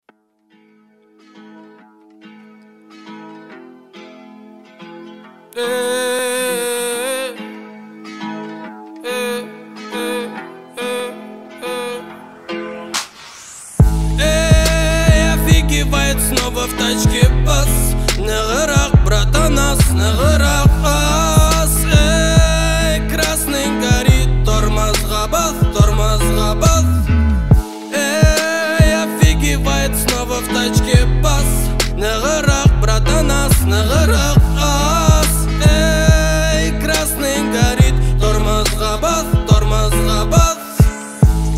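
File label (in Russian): играй гитара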